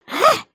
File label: wave; cannon